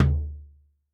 Surdo, Yamaha DTXpress-II, Perc

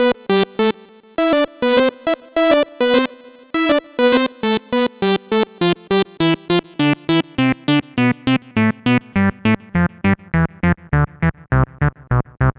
Synth Lite; Sonic Foundry (Sony Creative Software) - Rondo Brothers Trip-Hop Loops & Samples; Liberation

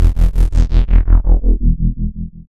BASS, Tekno Industrial; East West

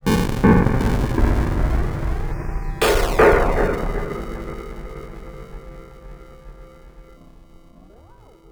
Bit Crush & Moog Delay, Wire & Tin, contact mic; musicradar; contact mic madness

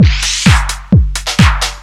wheeze; Zero-G Deep House; 130bpm

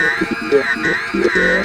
Weirdwarble; Sonic Foundry (Sony Creative Software); Synchro Funk

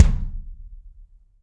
BD AMBIENT, Drums; Real Mega Drums

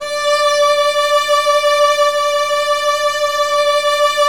Virtuoso Strings D3; ILIO; Kirk Hunter Virtuoso Strings VOL-2

